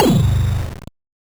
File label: Noise, 8-bit, Bitty Noises